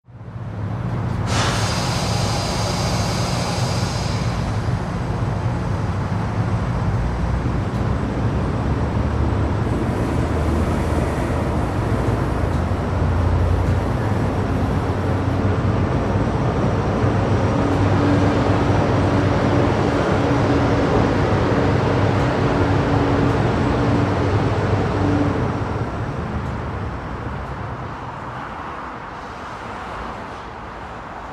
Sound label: Subways & Streetcars rail SFX & ambience; Soundiron; Kontakt